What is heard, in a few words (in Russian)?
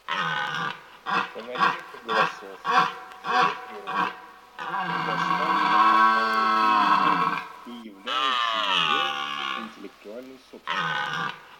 Олень Cervo europeo; plurifon